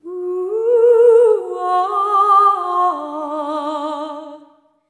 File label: SERENE, Symphony of Voices; Spectrasonics